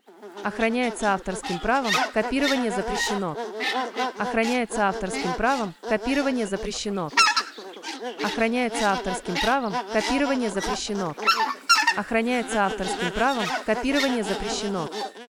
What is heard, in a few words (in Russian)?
белолоб самцы стая, призывы самцов на фоне активной присады.